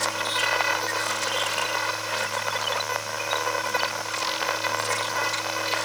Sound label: Camera Loop; Warframe; Veilbreaker; Quests; Kahl